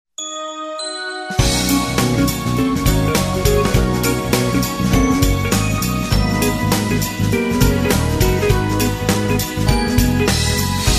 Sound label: bgm